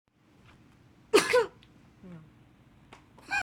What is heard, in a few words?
laughter